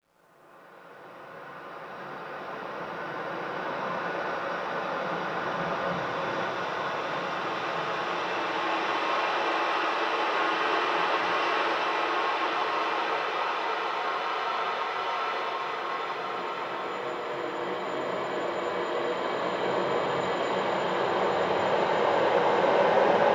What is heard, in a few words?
Locks River